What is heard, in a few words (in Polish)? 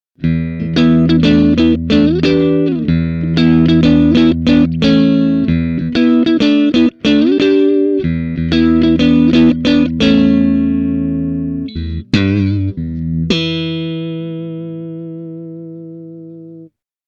Czysta, classic strat humbucker